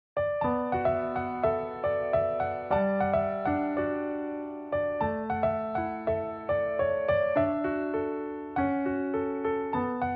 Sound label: Piano Version